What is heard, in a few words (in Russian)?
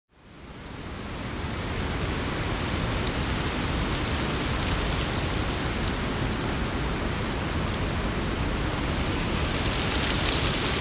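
ветер птичка листья; Звук ветра в лесу; звук ветра в листве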